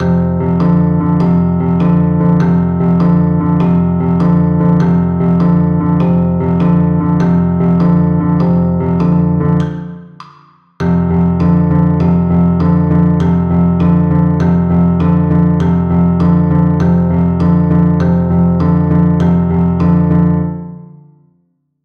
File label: shuffle